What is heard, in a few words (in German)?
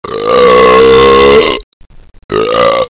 ruelpsen; Rülpsen 12